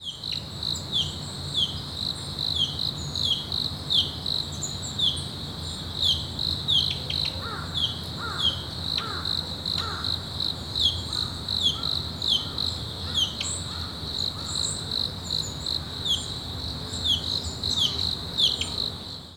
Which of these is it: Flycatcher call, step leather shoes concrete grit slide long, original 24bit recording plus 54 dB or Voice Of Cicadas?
Flycatcher call